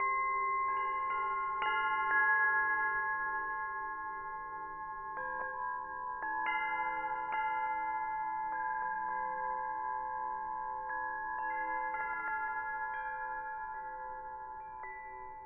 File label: haunting bells; Rumbles